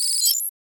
data analysis, anime

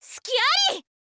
swordwoman attack

